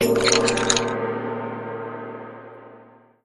level start; inlogic-zootrivia

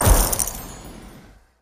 coins burst out; lightning speed

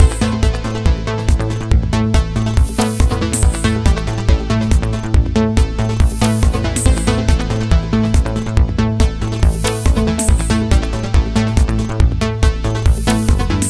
menu; ColorPin

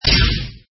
wall hit flash; inlogic-castledefense

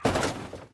car crash side; lightning speed

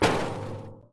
object hit metal barrel; lightning speed

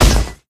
enemies exploding hit; spider-man-mysterio-rush-game

